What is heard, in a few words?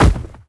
human bodyfall soft; npc